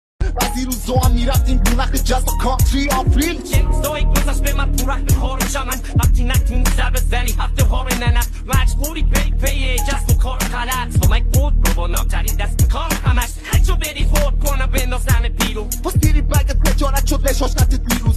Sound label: rap